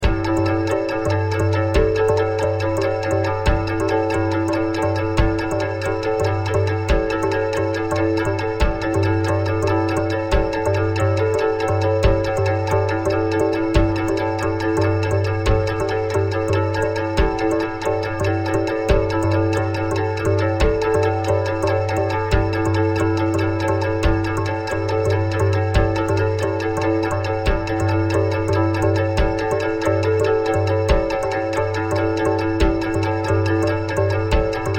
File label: 2 5 polyrhythm